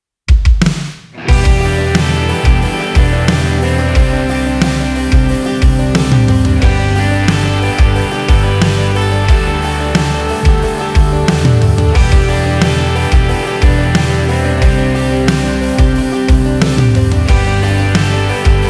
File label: NEW COUNTRY SAMPLE TRACK